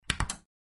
Tv Off; Phasmophobia

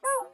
Snowman hit; Phasmophobia

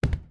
Heavy Book Drop; Phasmophobia

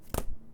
Paper drop sound; Phasmophobia

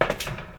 Truck sprint; Phasmophobia

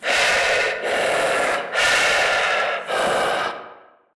breathing; Phasmophobia; Deogen

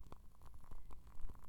Candle wick burn; Phasmophobia

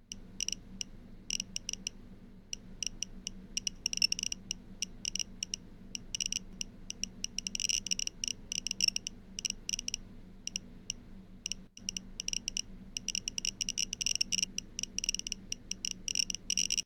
EMF 2 to 4; Phasmophobia

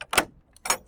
Gate Latch; Phasmophobia

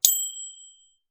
Level up ding; Phasmophobia

